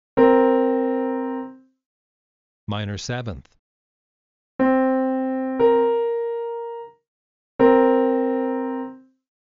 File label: Minor Seventh Example; interval